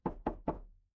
door knock

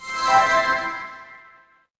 magic scifi heal

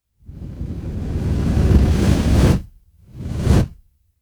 fire electric whoosh flame

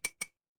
glass glass tap

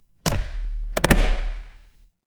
office stapler

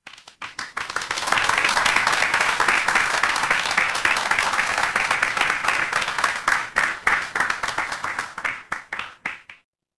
crowd applause

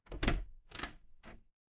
door open

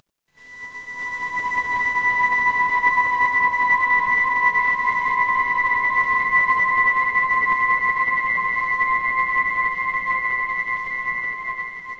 ambience room tone

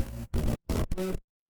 transition glitch

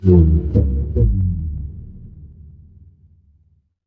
magic scifi shield

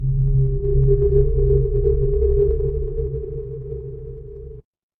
magic scifi alien

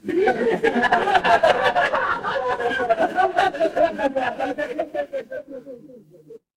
crowd laughter

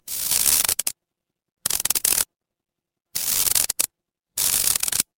fire electric arc

